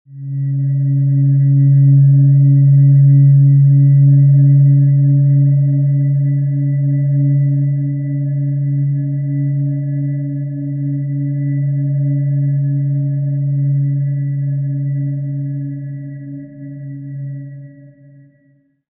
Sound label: Bed Bell Drone High Fr